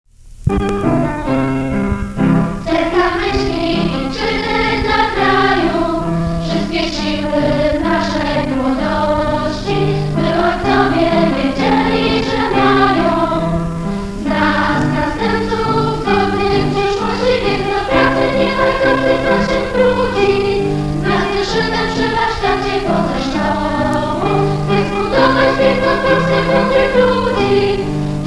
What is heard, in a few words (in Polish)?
hymn wokal